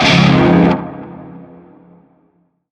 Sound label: Shawzin Phrygian Chord; Warframe; Narmer Shawzin